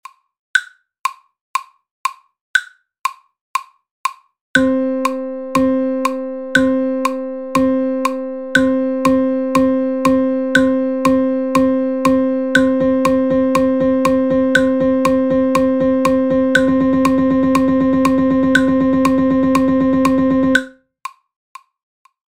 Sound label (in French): rythmes